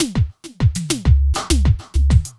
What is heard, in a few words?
drloop, drumloops, Vinyl Attack; Masterbits